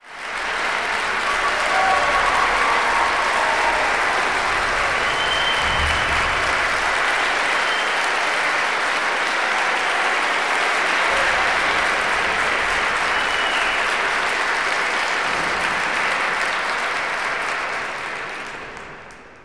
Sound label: crowd, spectator